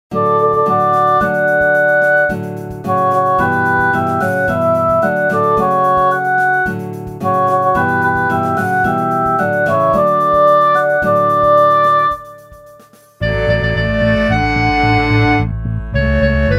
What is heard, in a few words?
chor span